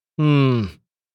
Thinking hmm anime villain vocalization

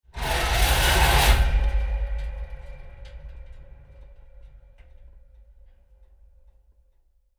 Resonating springs dragging and scraping

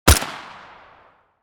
Tactical assault rifle single shot

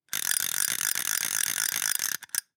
Fishing rod spinning reel

